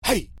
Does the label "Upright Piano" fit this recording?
No